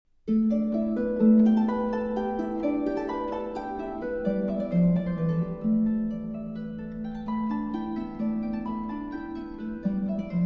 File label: Sonata for Harp, Op. 127 I. Allegro; Hovhaness